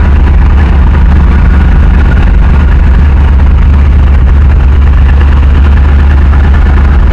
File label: Vehicle Engines; Red Orchestra Ostfront 41-45